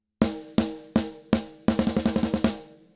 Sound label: Compressor snare Snare Thwack